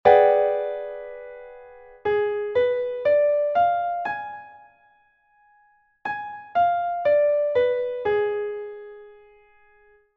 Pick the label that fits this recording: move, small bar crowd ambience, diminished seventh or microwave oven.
diminished seventh